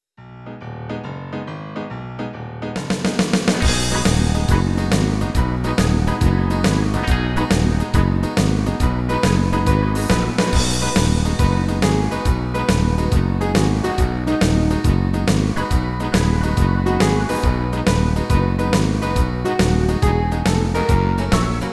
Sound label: hit hit hit